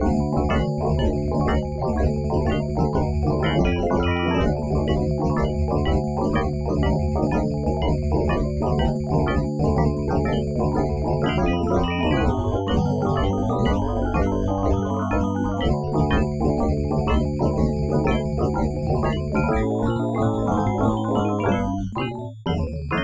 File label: 8 bit elephant